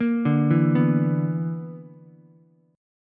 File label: B-Minor Major 7th Double Flat 5th B down Ukulele; BmM7bb5 arpeggiated; JGuitar